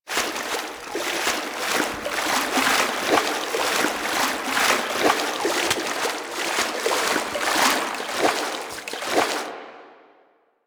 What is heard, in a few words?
Horse Steps Gallop Loop Water; Vehicles; Duviri